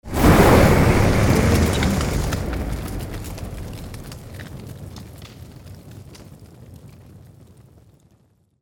scorpion fire breath

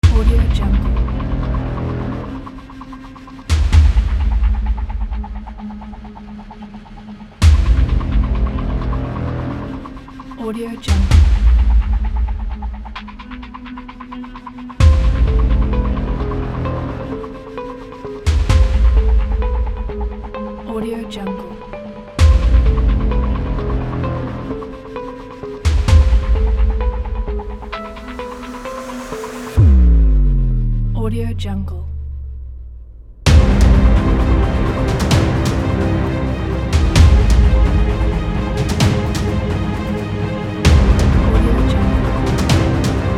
thriller

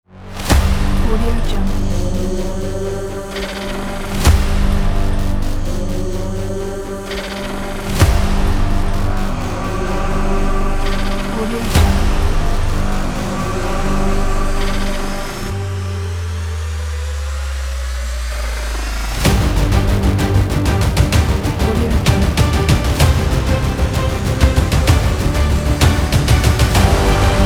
film trailer

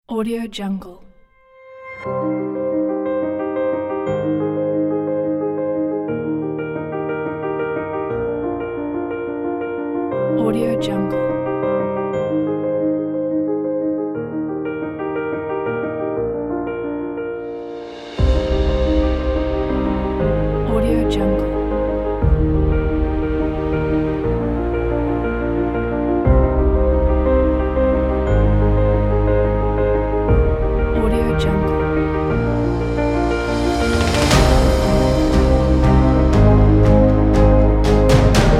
epic emotional corporate pack

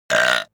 cartoon vocal burp short

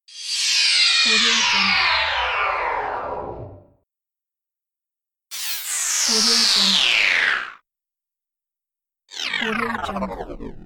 decrease energy

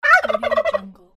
turkey gobble

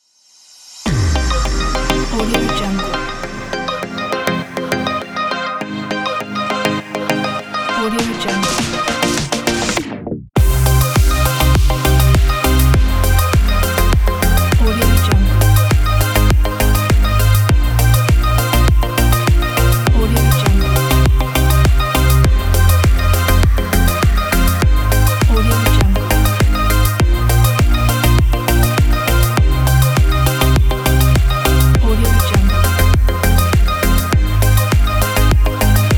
tropical summer pop

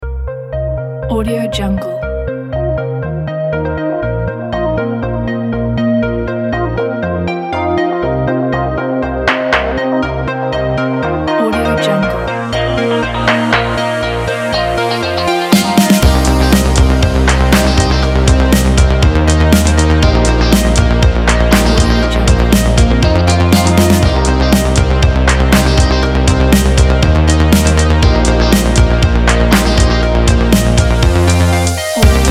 the 80s

